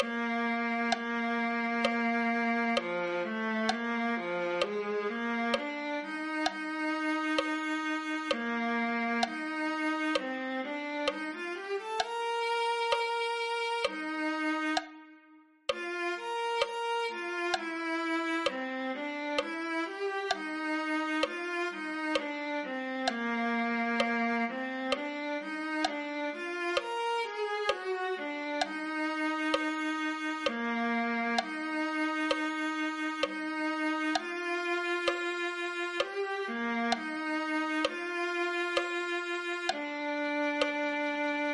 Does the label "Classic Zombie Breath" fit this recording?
No